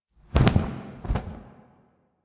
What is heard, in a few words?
Owl Finisher Cycle; Duviri; Animals